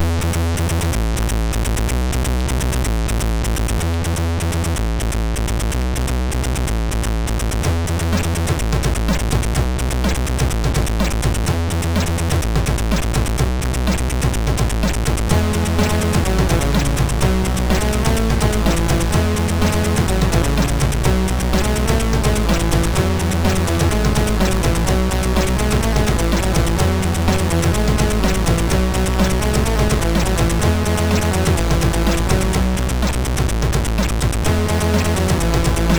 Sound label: Crash, sid MOS6581R3